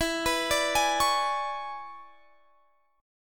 E 13th; arpeggiated; x,19,21,19,21,21

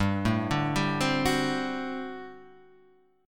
G Major 7th Suspended 2nd Suspended 4th, chord; GM7sus2sus4 arpeggiated; 3,0,0,0,1,2

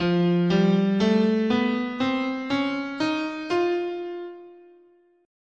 F Lydian Minor, scale

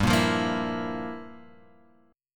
G Minor 13th G; Gm13 Chord {3 1 3 3 X 0} strummed

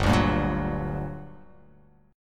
G Minor Major 7th, down

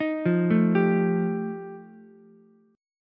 F 9th F Ukulele C6, chord; arpeggiated; JGuitar

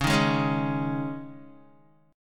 C sharp Suspended 2nd C sharp Guitar, chord; C#sus2; strummed; JGuitar

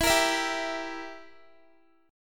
E Diminished down Guitar; strummed

